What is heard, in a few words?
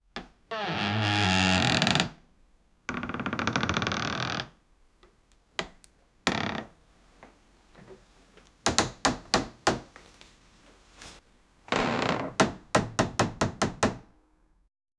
sounds of footsteps on th; door